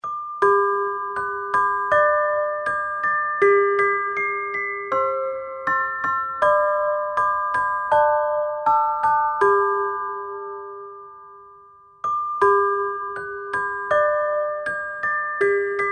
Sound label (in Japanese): ookina furudokei chime